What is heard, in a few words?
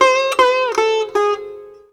SITAR LINE; SITAR RIFFS2